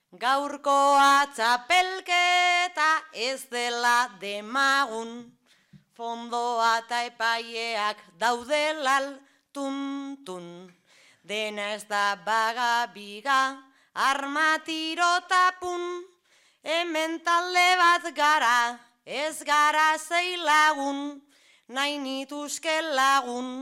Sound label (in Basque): agurra, bertsoa; dulantzi